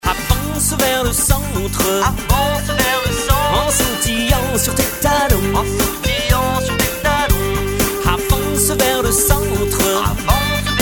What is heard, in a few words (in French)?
guitare